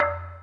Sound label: DING F4; FantasyTennis